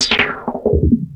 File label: filter roll